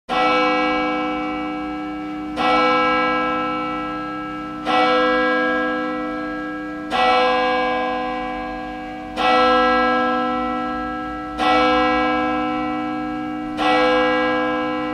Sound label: Church Bell Music Ringtone